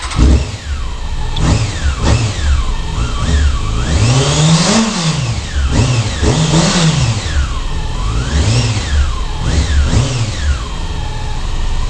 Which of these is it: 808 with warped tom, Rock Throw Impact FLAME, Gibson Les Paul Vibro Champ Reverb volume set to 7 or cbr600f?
cbr600f